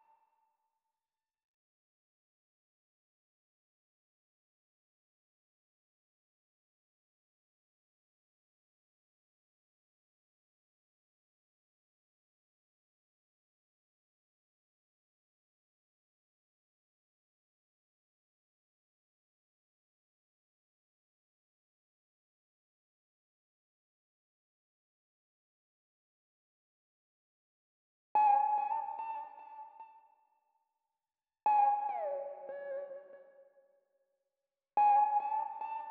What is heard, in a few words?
Lead Pluck, 145 BPM F# Min; Loop Stems; Melodies; Cymatics; 2022 Melody Mystery Expansion; Overdrive